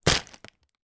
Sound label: pork pie down in wrapper; zapsplat